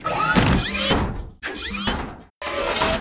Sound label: WAR IDLE; Mob